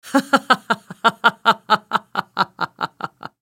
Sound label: laugh; Sejuani; Character Voices - English